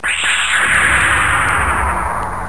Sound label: scifi